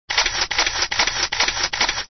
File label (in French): Appareil photo